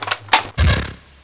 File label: clip insert plasma